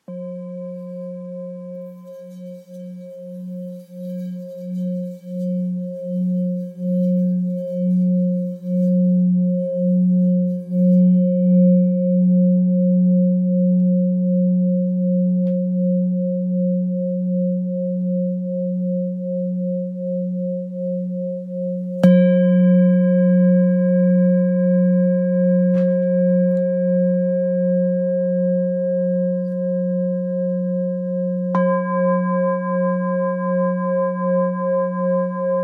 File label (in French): Bol thick fa diese